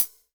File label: HH WET, Drum Mashines, SR-16 DRUMS; EdgeSounds